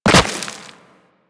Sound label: Land Soft Rock, Footsteps; Warframe; Operator